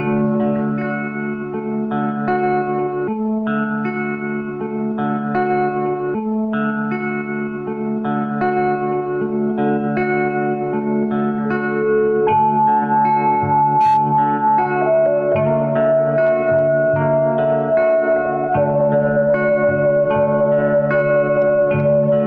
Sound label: guitar flute, Phonky Loops